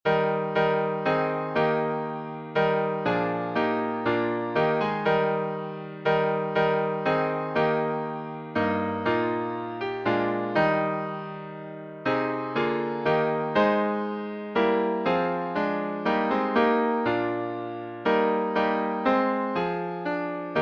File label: Hymns